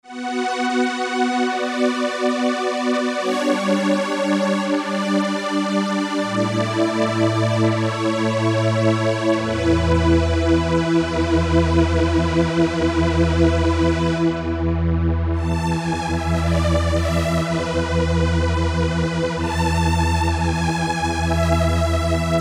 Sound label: Pad, Korg MSC-12S Synth; Synth 3; Famous Pad 2